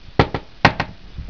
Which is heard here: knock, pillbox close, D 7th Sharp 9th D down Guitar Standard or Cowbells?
knock